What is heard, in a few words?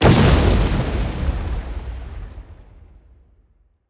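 big win explosion; netent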